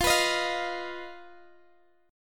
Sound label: D sharp Suspended 2nd Sharp 5th